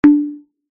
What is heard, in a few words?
coinflip loss